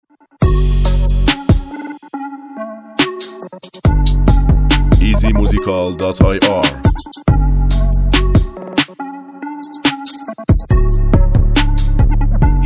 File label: Beat